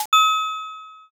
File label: register